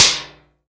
plate, Drums & Perc; AKAI S6000 CD-ROM - Ultimate

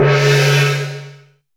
chinese gong; AKAI S6000 CD-ROM - Volume 5

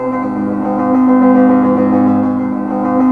GUITAR LOOP; Zero-G Ambient 1; WEIRDATMOS#1

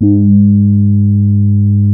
TAURUS A2, synthesizer; Hollywood Edge - Giorgio Moroder Rare Synthesizer Collection

